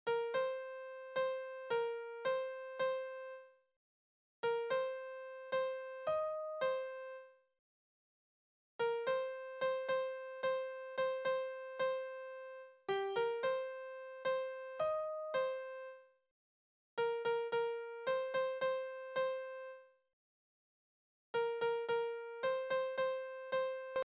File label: melody